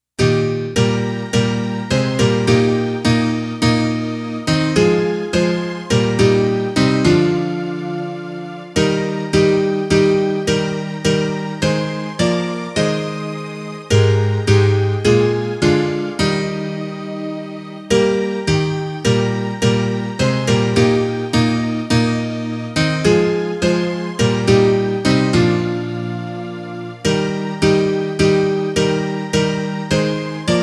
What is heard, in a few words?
instrumental; Sou Pequeno; Hinário "Hinos"